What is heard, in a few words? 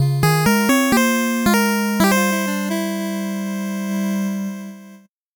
8bit jingle fast